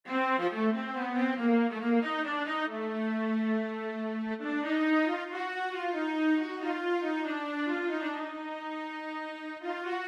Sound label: Fugue